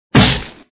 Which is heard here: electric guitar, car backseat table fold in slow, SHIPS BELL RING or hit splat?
hit splat